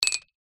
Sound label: foley bottle top metal down on wood surface